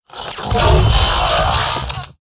Attack; Flickering Lightbot

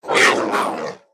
Springpaw Play

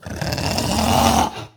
Red Mana Wyrm Play

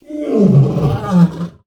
Death

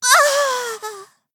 Vulpera Death

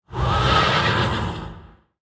Imprisoned Celestial Attack

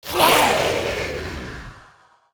Darkspawn Attack

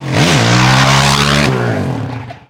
Spiked Hogrider Underlay Attack

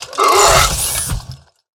Shambling Zombietank Attack

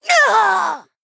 Female Blood Elf Death

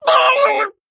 Male Murloc, Attack

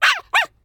Trusty Pup Attack

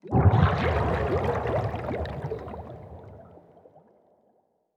Underwater Death Underlay

